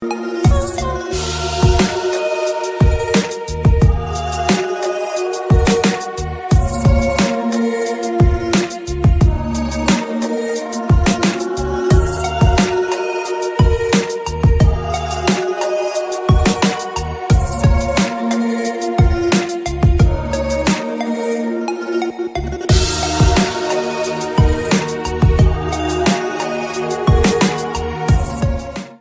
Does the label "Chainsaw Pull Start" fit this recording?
No